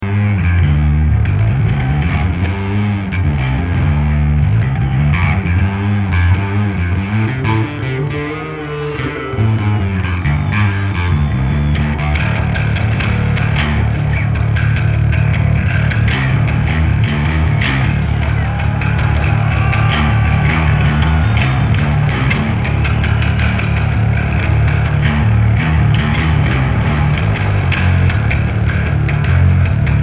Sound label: Bass Solo